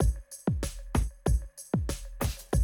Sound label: Classic DrumLoops